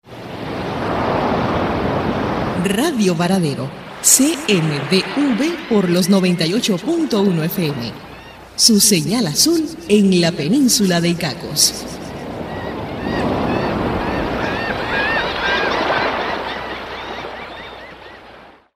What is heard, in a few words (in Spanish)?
Indentificacion musical Radio Varadero; Radio Varadero, la FM azul de Matanzas, Cuba